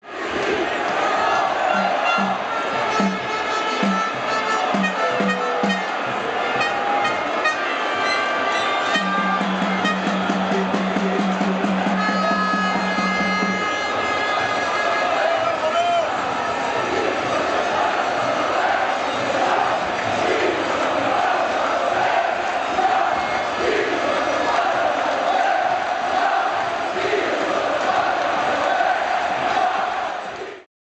American football game crowd television

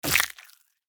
Worm squashed organic impact; slug and worm

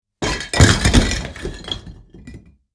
Big blocks structure collapse on bricks and rocks

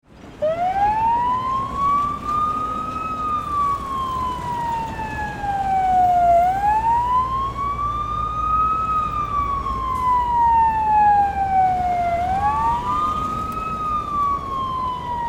Fire truck passing by with siren

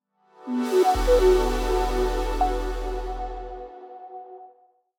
Hi Tech logo intro

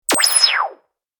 TV switched off television turn off; film and tv

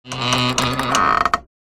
Vampire coffin lid open

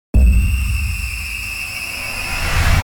Granular madness impact jumpscare suspense

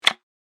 Puzzle game tile swap; video game

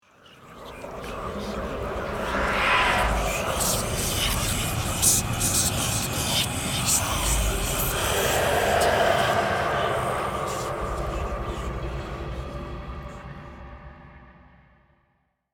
Scary whispers whoosh transition; suspense

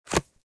Wallet credit card sliding into slot; purse

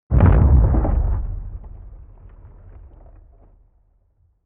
Wood impact low explosion sweetener

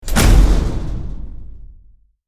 Tank armor hit by enemy fire

